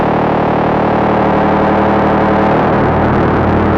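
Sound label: monotron delay - Fm - 132, synth loops; monodelay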